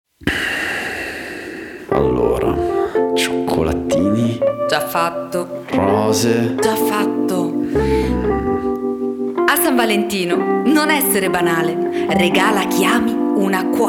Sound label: Jingle; regali